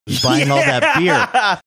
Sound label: laughing saying yeah